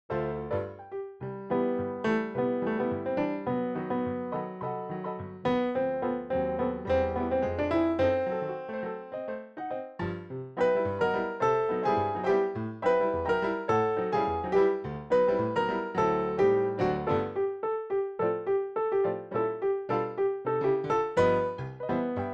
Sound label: Plunk Track